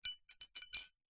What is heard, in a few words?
foley metal objects handles x2 lightly clash together clink